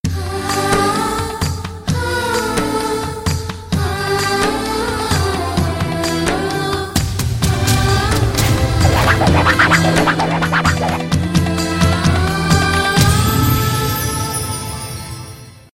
Interval Bgm

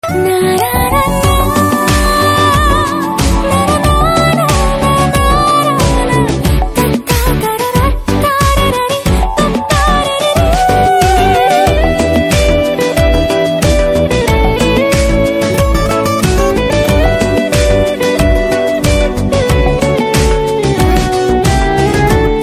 BG Tone

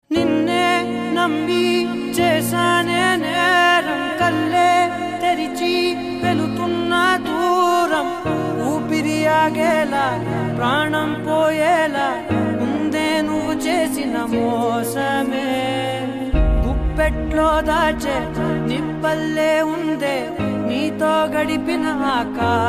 Sad Version Ringtone

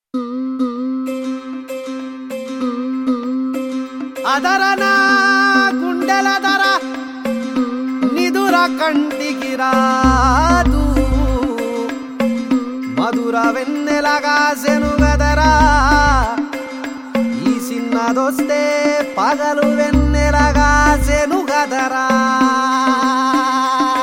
BG Tone

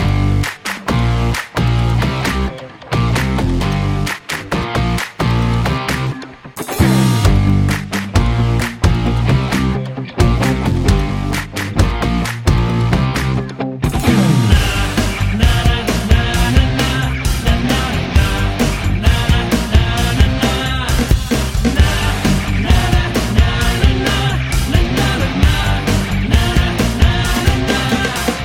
BG Tone

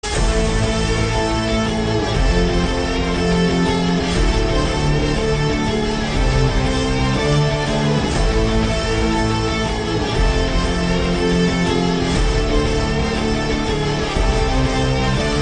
CLIMAX BGM; KGF; Hindi

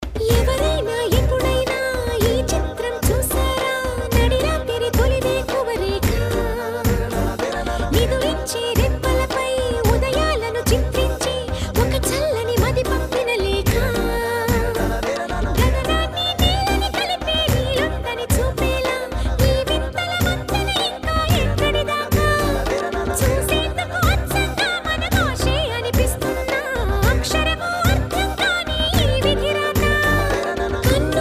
Female

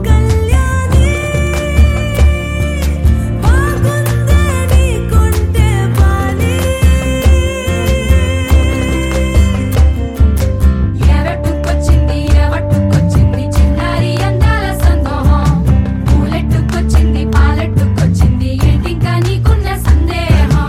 Ringtone Female

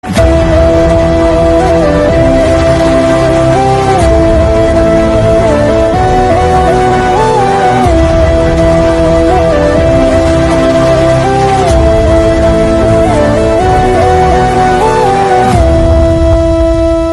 Flute Bgm; KGF

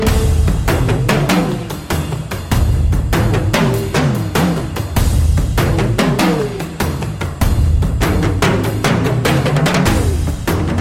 Drum Beats Ringtone

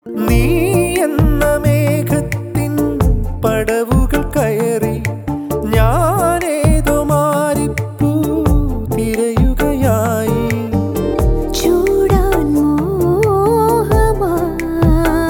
Love Male Ringtone

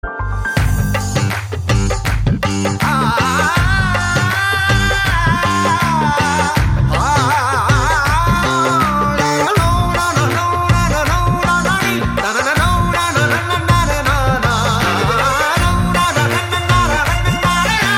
Humming Bgm Tone

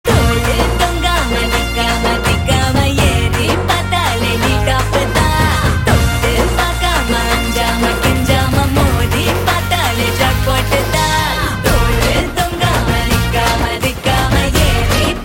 Love Ringtone

